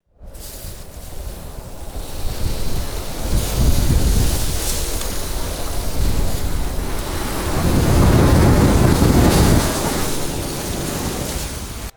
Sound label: strong howling wind gusts and rustling branches